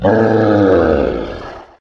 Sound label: golem special